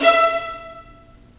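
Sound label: violins marcato, String Sections; Roland LCDP13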